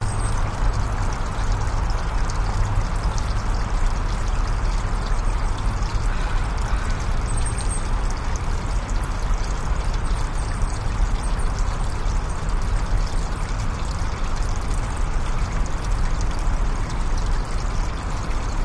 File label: park little creek birds traffic airplanes; vancouver; stanley park; your free sounds